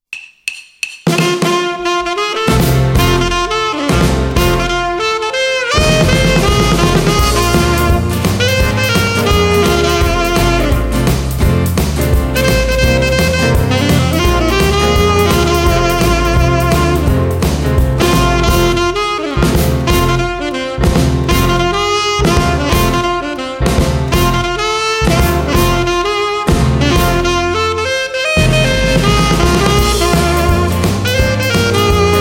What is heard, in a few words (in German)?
Saxophon